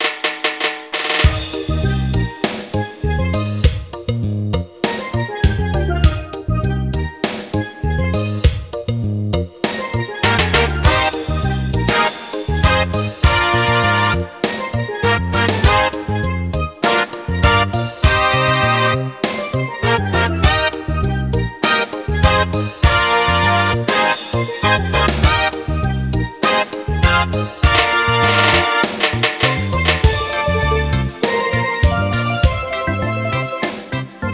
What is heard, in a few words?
Reggae